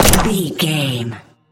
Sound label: gun pistol shot